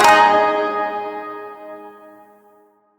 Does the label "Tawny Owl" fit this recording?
No